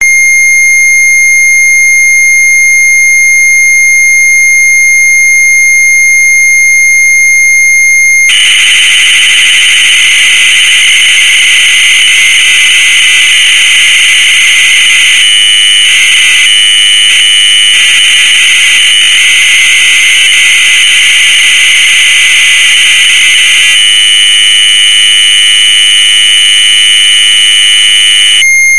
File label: z80 load tape; 32char; digital group; z80 software digital copy; hamdemo